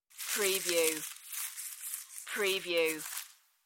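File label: Leaves Crackle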